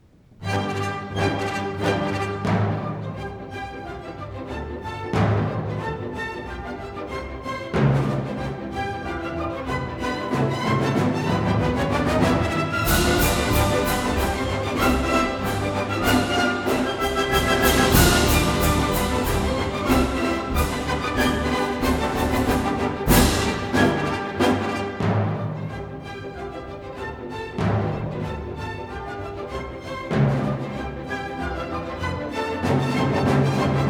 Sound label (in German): Polka schnell; 维也纳新年音乐会2014; Op. 271